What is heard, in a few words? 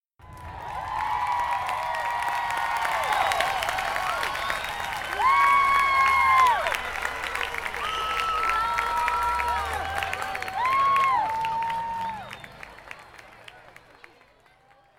cheering